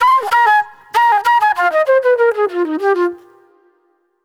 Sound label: NY 70's Jazz Flute; Sonic Foundry (Sony Creative Software); Manhattan Flutes; Session 01 - Benedetta